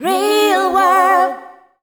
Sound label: FEMALE CHOIR, Dance Vocals